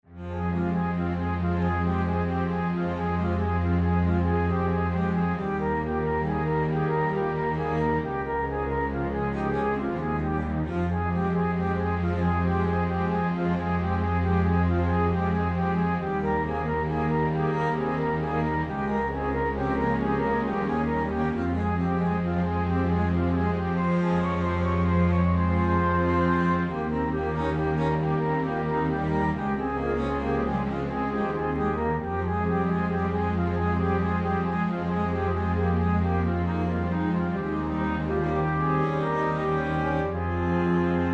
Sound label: FLUTEY VIBES